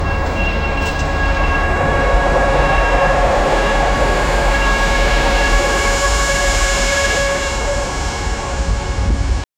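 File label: long distance train passing; railway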